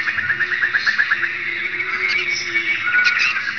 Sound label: jungle; forest